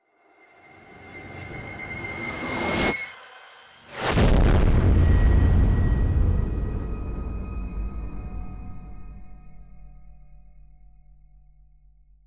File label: multidimensional cataclysm; rifts; Stellaris; astral planes